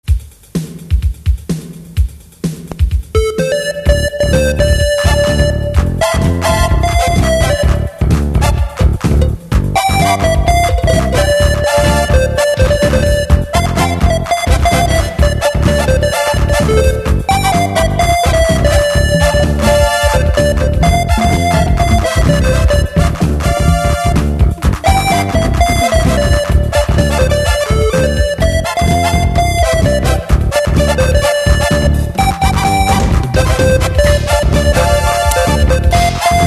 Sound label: square wave lead